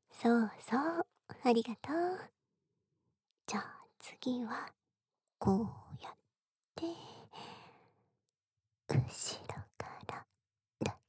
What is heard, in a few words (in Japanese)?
耳なめ(左); お屋敷づとめ。Vol.10―相沢南奈 至福のナイトマッサージ―